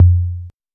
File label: old school long tom drum f key, Royality free tom drum tuned to the F note; tom drum samples; one shots